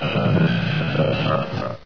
baby panther idle; svencoop; custom monsters

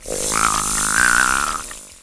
hiss, pupae; svencoop

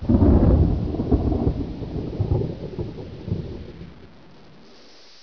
portal; svencoop; echoes; outtro

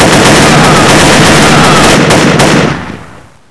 buzzer; svencoop; sence; mistake coop

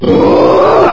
ghost alert; svencoop; custom monsters

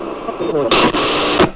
radio; svencoop

